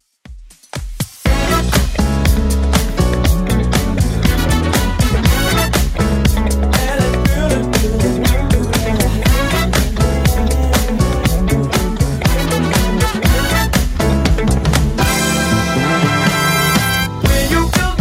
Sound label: 70s Club Edit 120 bpm; remixplanet